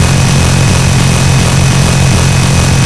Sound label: gatling fire loop; Modern Warfare; minigun